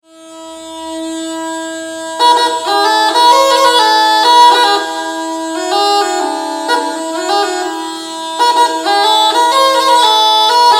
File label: sackpipa